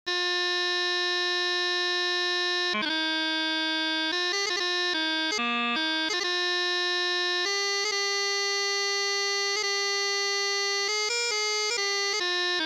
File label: Chanter; North Berwick Pipe Band